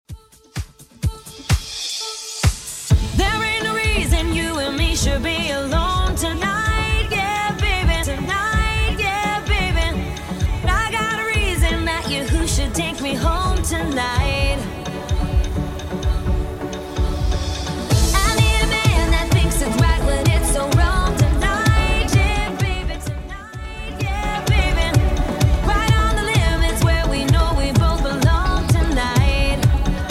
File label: 2ks Redrum 128bpm